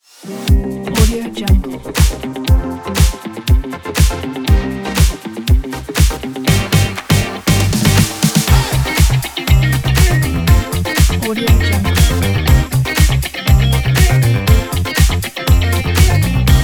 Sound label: funk happy